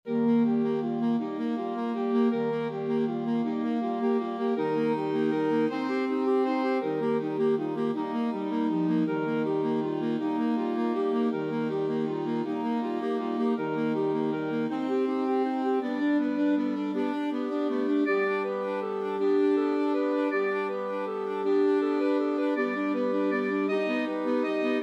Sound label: flexible ensemble, 4 players